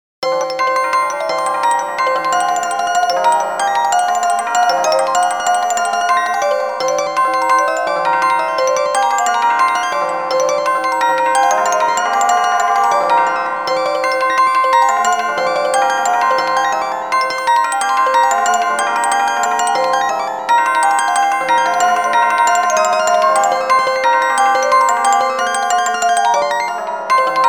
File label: half comma mean tone; fractal music; six eight